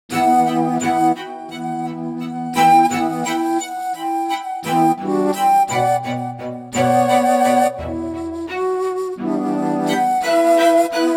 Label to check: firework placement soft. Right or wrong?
Wrong